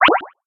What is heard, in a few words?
Beeps